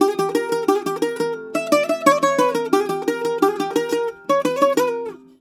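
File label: F#, bouzouki; USB Soundscan vol.53; Mediteranean Traditions; AKAI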